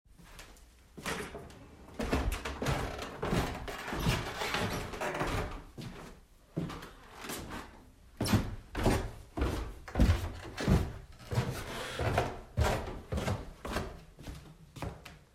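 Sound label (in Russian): звук спуска по скрипучей лестнице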